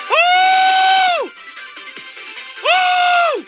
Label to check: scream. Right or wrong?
Right